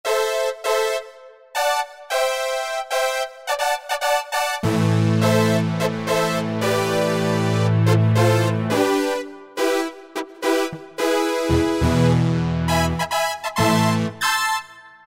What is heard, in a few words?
Br-Matrix, Yamaha EX5R; Factory presets